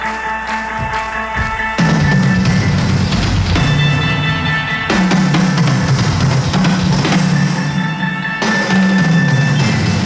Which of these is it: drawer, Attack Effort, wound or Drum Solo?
Drum Solo